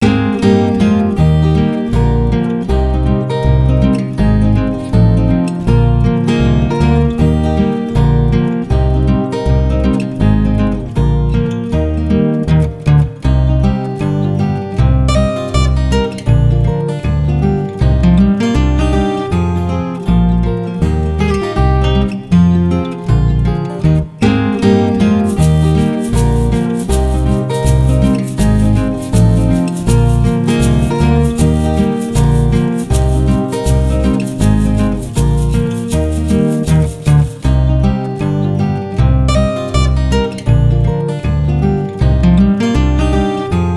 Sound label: Instrumental